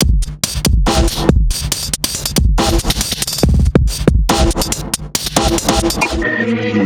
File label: drum